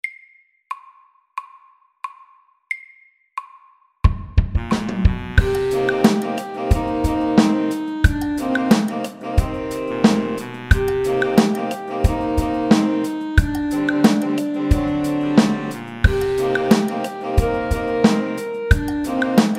Funky drums MIDDELS

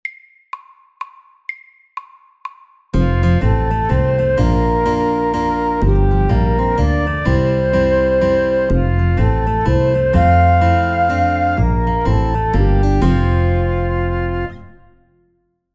Shuffle FORT

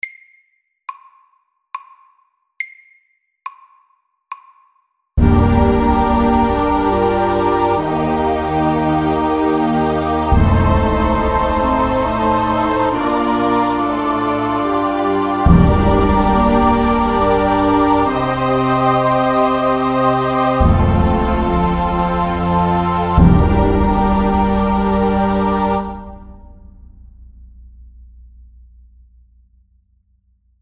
Eventyr SLOW 70

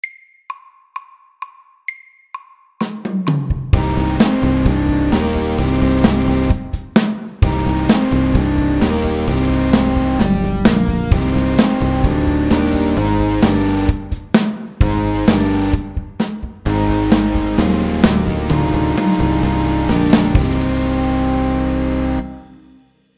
Metal rock FAST 130

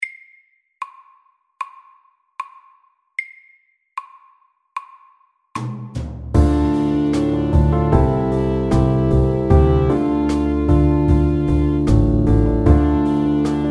Pop ballad FAST

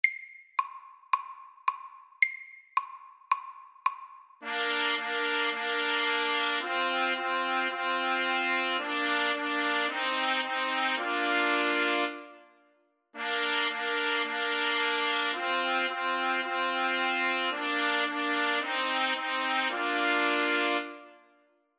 First fanfare MEDIUM 110

